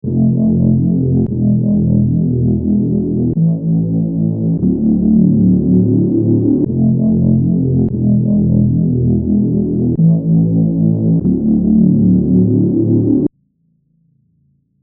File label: Bass Hack; trap vst